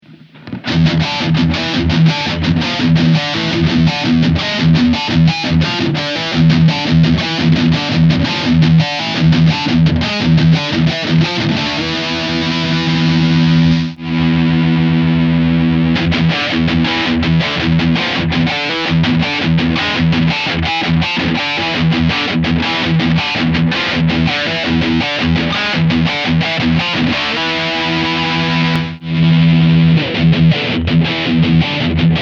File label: Blackstar Dept. 10 Dual Distortion OD1 Cab Rig